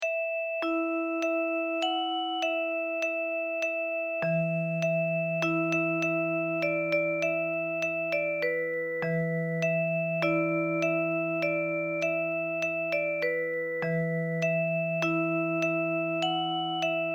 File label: japanese traditional folk song; kagome kagome